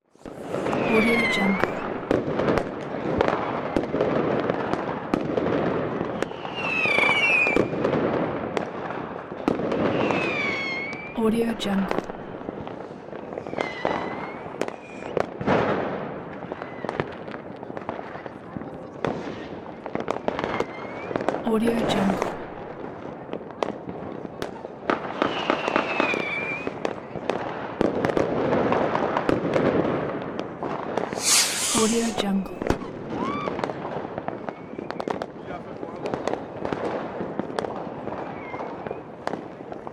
fireworks

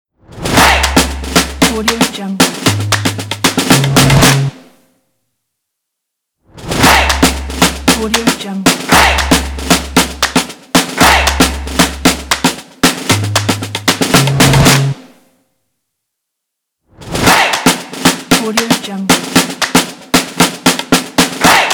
snare drum stomp logo

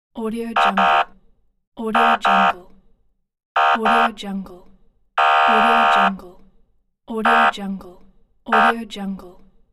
police siren horn

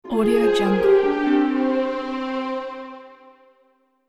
heroes demise

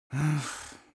human vocal male sigh

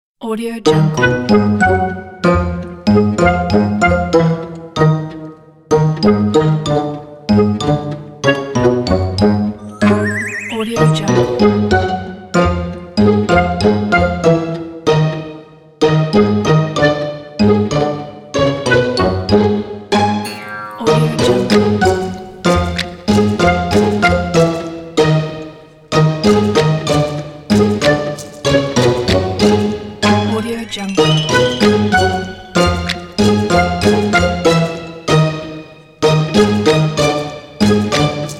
comedy cinematic

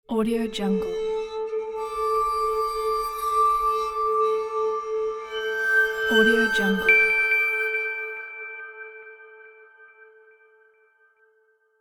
elegant logo reveal

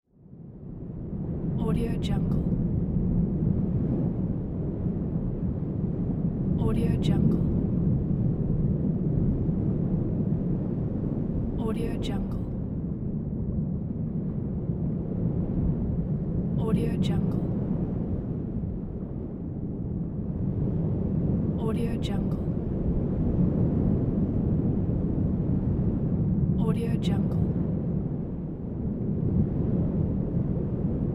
underwater

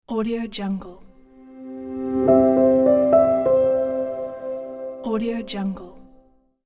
soft piano logo reveal

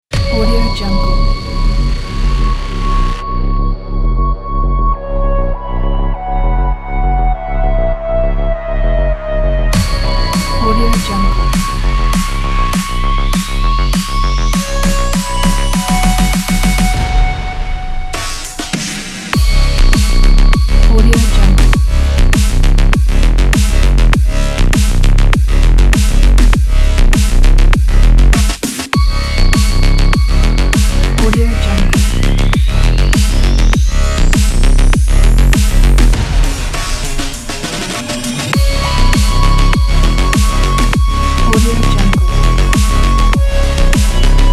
cyberpunk technology

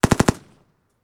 gun mac 58; pov

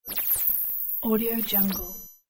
deep lights on effect; thesoundcraft

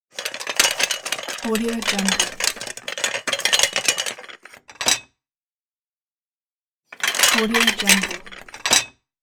stack of plates

